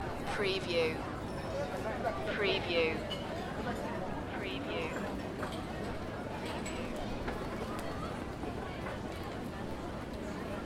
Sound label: Pedestrian Street Ambience, Athens Greece